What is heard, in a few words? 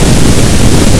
rocket